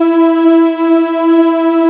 SMOOTH VOX E3, SMOOTH VOICES; Best Service Alpha Dance vol.2

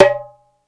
R-8 DARBK T, ethnic; Hollywood Edge; The Big Bad Beatmachine

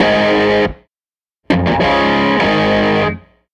Tele Guitar Loop

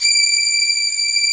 D 5, VIOLIN SECT; AKAI S6000 CD-ROM - Volume 1; Strings

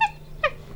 monkey; E-MU Producer Series Vol. 3 – Hollywood Sound Effects; Water

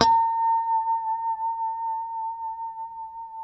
B5 pick harmonics, guitars; Spectrasonics; Hans Zimmer Guitars VOL-1; JC-HARMONICS

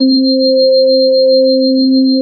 ANALOG ORGAN C3; EdgeSounds; Drum Mashines VOL-1

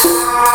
TIBET, CHORAL; FAIRLIGHT CMI IIX

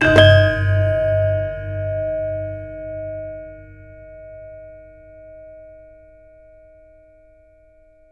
GAMELAN HIT; Spectrasonics - Heart of Asia CD2

